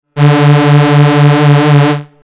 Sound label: saw